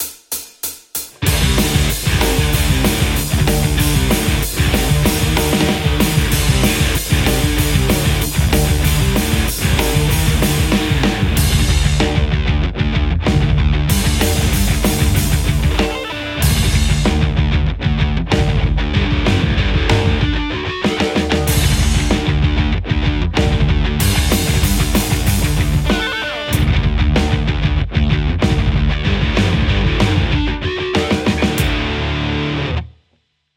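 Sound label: metal Stratocaster; kuassa vermilion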